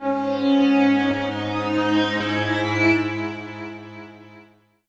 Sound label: Platonic, Strings; Zero-G Smoke